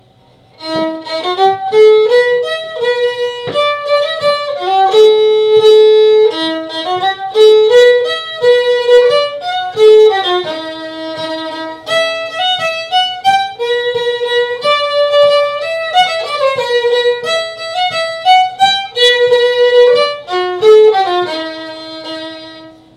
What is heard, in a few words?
castlehill, morris